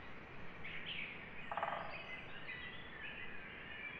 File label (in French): Foret picvert léger; Oiseaux